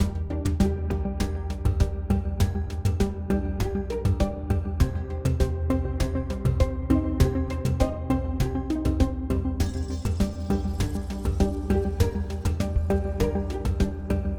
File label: Music Final Theme